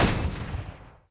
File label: bullet; Risk of Rain